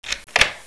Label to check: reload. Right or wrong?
Right